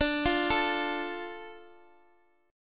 D Minor D, chord

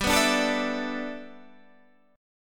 G 6th Add 9th G Guitar Standard, chord

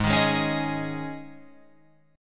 G sharp Suspended 4th G sharp, Guitar Open F; strummed

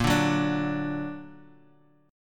A sharp Augmented; A#+ strummed; JGuitar

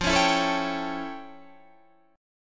F Augmented Major 9th, Guitar, Half Step Down

